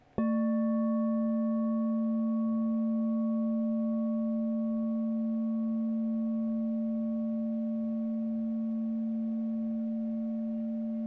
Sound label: 8 inch LOW A +33, crystal bowl